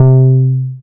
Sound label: BAS MKS-50, Roland